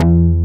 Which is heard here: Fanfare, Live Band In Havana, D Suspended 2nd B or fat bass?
fat bass